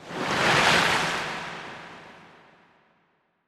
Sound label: WATER WAVES; zoochosis; lazynap; dolphin deepsea monster